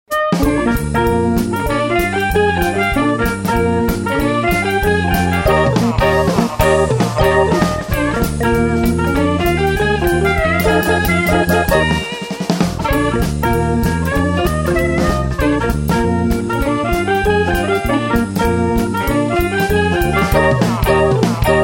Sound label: Creek Shuffle